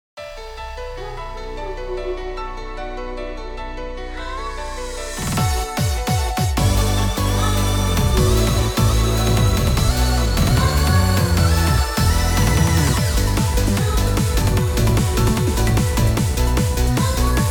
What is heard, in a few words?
Rhythm